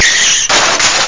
car sk

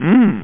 ungh hmmm

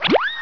draw tool; Etch A Sketch Quick Tour

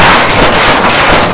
ROLLOVER

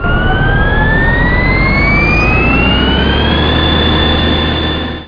TURBO N; sw demo

